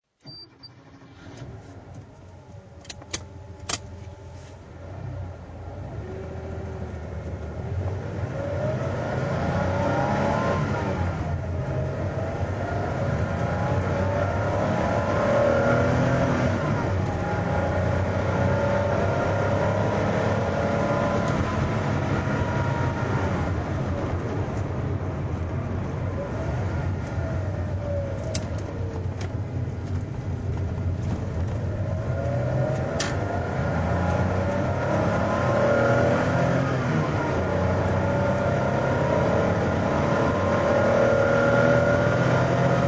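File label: 911 carrera drive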